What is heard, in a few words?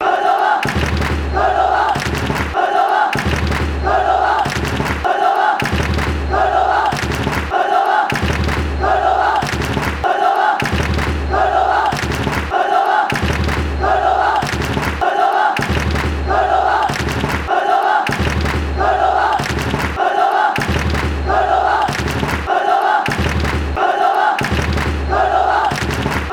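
cordoba cordoba, football chants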